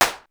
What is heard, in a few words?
lo claps; sr16 perc